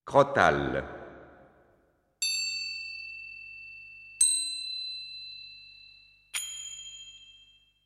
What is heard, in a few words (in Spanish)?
Crótalos